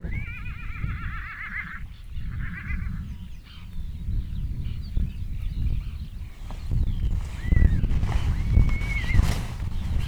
tavolban verekednek, vadlo; hortobagyi nemzeti park 2013

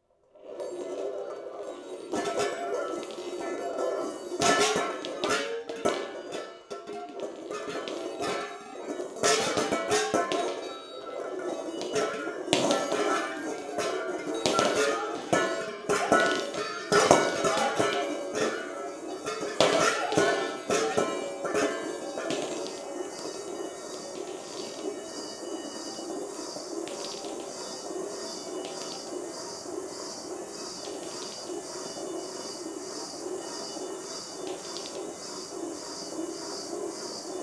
golyók keringtetése, golyók fémtálban keringtetése hangkeltés; perkupa

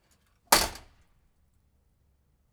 kertikapu

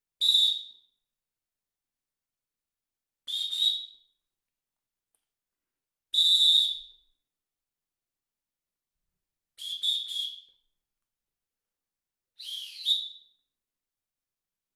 bírósíp; sip biro 2015; perkupa

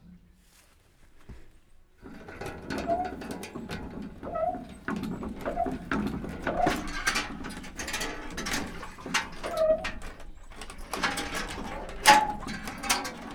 vízhúzás, kerekes kút; varboc

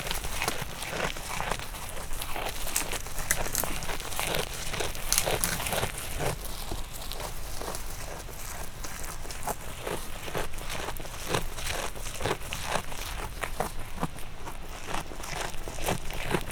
kecske eszik ágtörés; parasztudvar; hazikecske

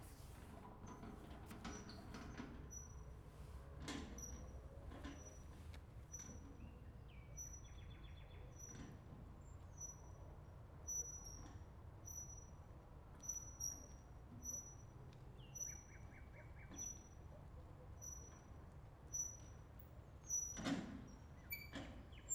jobbrol a mikrofonig majd vissza, talicska

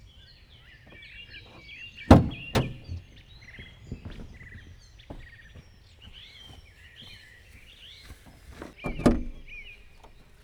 motorhazteto automatikus kinyitasa kezzel torteno lecsukasa, auto opel; opel 2015; vizhaz; cardioid

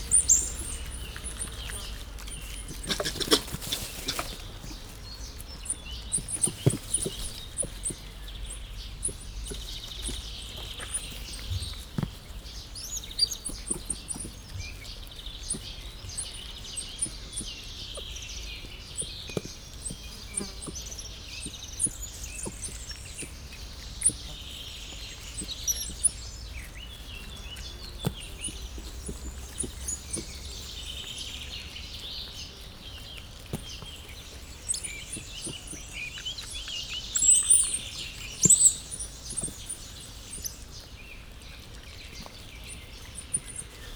etetes, allathangok ormanyosmedve; jaszberenyi zoo 2015

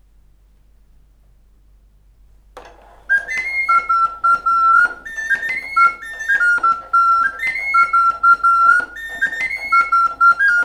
madarorgona 10 hangu, verkli; serniette; 1780; franciaorszag

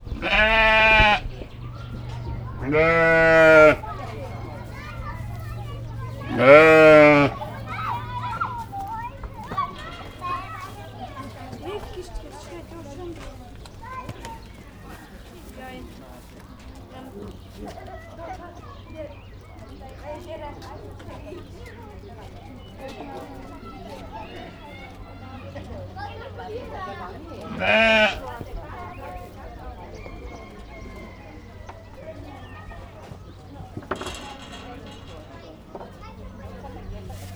juhok begetnek, parasztudvar; nyiregyhazi zoo 2010

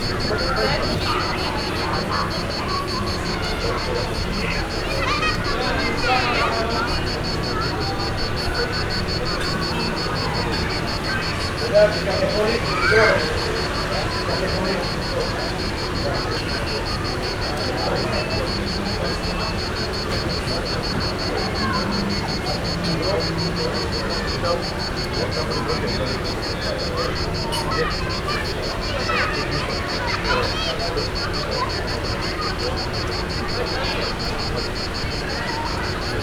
kaboca vendeglo strand, tengerparti hangulat; makryammosbeach; thassos

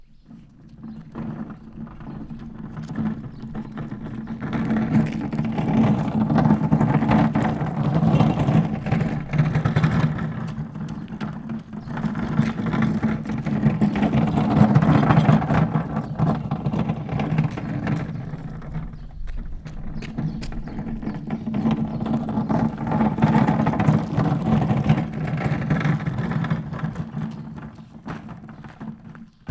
három forduló, kukaedény; varboc

